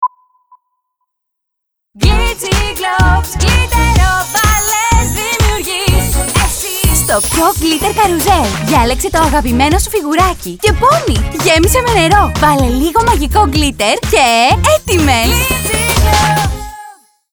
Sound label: jingle; glitsy globe